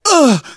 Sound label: hurt minor, survivor voice, manager; source engine; left 4 dead 2